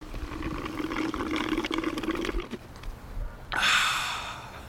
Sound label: Drinks